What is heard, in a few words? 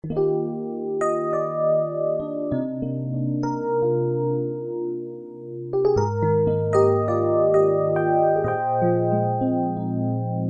Soft ep